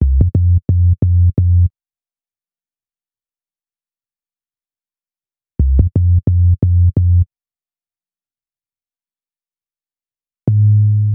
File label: Bass, Hip-Hop